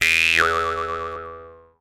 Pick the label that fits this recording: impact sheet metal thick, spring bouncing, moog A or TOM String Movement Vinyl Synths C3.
spring bouncing